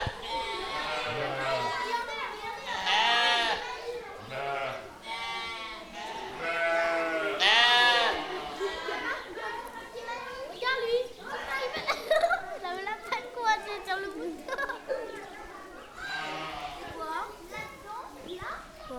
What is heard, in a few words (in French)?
mouton rire enfants